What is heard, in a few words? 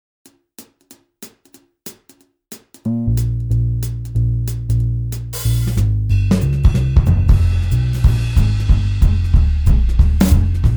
swing shred backing